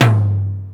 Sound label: Tom Lo Mid, Ensoniq Mirage